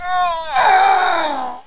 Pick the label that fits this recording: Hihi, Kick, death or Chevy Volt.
death